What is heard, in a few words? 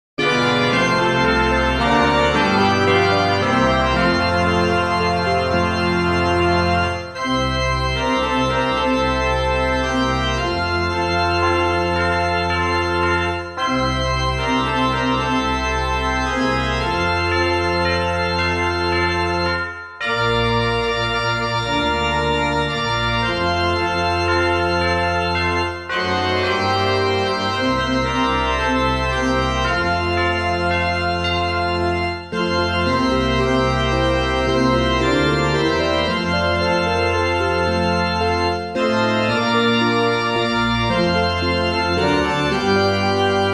midi